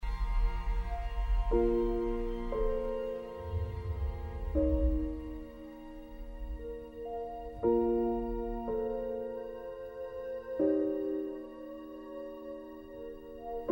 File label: Piano Duet